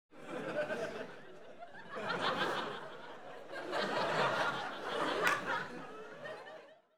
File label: Light Entertainment Audience, Continuous Laughing